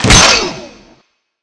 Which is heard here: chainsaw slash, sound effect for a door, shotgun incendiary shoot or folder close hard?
chainsaw slash